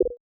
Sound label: combobreak; Nino